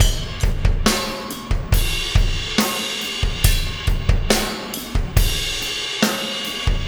Impact Crater Beat, Processed Drumkits

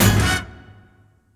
Double Stab

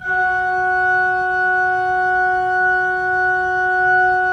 Pipe Mix Celeste, 3D Organs

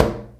chim; AKAI S6000 CD-ROM - Volume 5

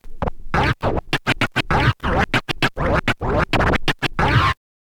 scratch kit, vinyl

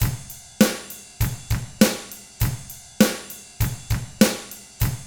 ROCK, Drums; ILIO; Double Platinum Drums 1

